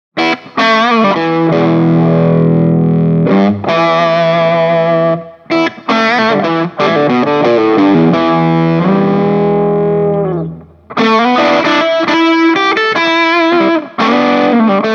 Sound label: lespaul low gain